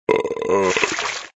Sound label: reaction vomit; coffee shop gamerip